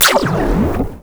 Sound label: blast